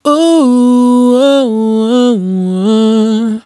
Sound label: riff ooo wah A, Dry Vocals; Raw Vocals; Slate Digital Omnivox Vocal Sample Pack